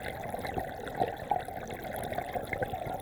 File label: Waterway E Dark